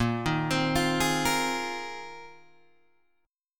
Bb 9th Suspended 4th Bb down Guitar Open G; arpeggiated

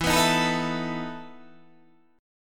E Major 7th Suspended 4th

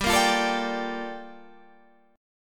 G Minor 9th

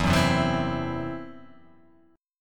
E Suspended 2nd Sharp 5th E, down

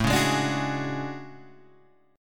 A Major 13th, down Guitar Standard; AM13; strummed; JGuitar

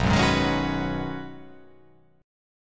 B 6th Add 9th B, chord

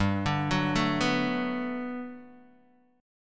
G Major 9th, G, down, Guitar, DADGAD

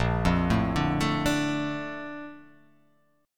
Bb Major 7th, BbM7 chord; x,1,3,2,2,4,4,x; arpeggiated

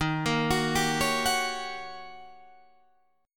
D sharp 7th Sharp 9th, Guitar, Open D

